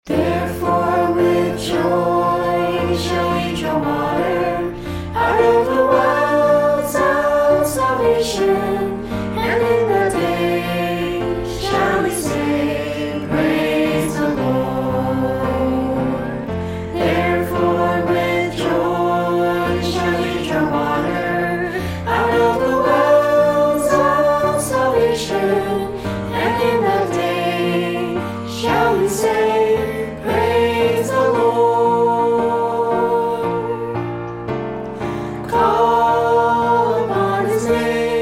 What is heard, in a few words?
sing; Hymns; Hymnal